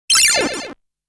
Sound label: Time bomber explosion; genshitou prehistoric isle